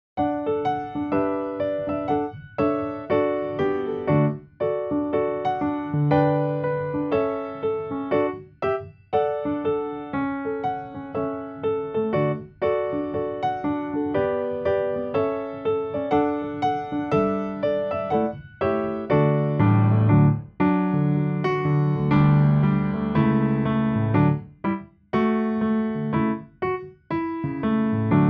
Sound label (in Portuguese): (completo) (Backing), playbacks musicas criancas; primeiros passos